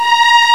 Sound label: STRNG, FAIRLIGHT CMI IIX STRINGS